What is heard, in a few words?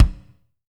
BD, DR BD; Best Service Gigapack 2